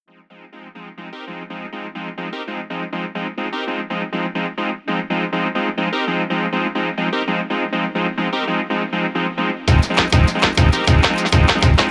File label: UNSTABLE TONE BROKEN THROB